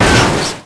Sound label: break generic metal